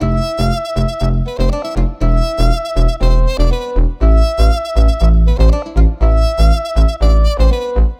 slap ethnic loop

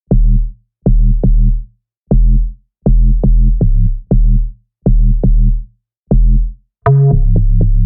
dope bassloop d min bass 120 bpm; monosounds

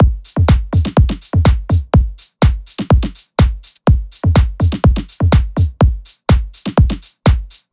house drum loop 124 bpm; monosounds; evoque

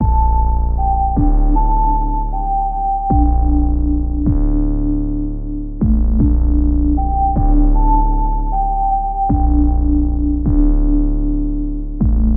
athmoz 155 bpm d min; monosounds

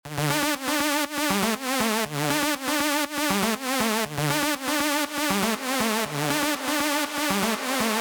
synth lead; monosounds

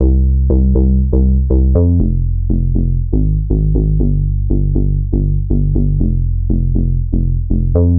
dope bassloop c min 120 bpm; monosounds

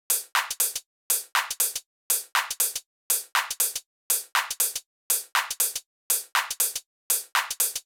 top loop 122 bpm; monosounds; fishlake vol.2

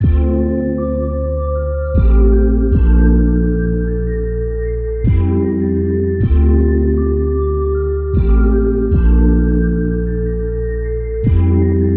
athmoz 155 bpm d min; monosounds

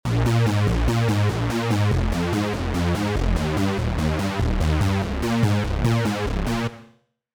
eurobeat lead

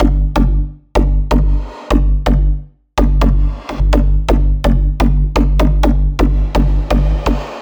slap house loop

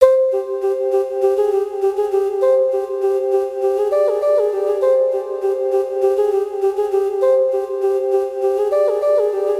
flute 100 bpm f min; monosounds; nanga parbat

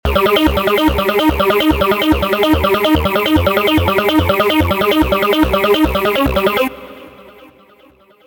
eurobeat pluck

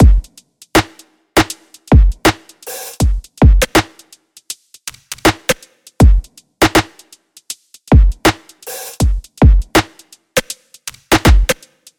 drum loop 80 bpm; monosounds; evoque

